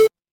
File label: retro game coin